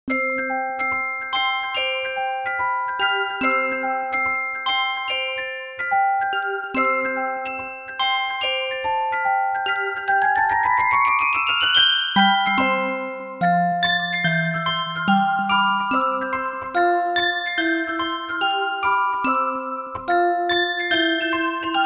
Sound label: High Bell, Kids 귀여운